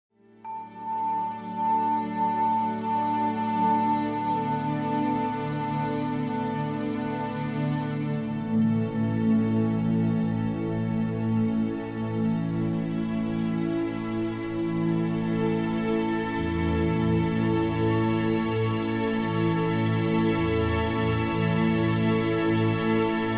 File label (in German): Heilende Musik fuer Tiere; Heilmusik fuer Tiere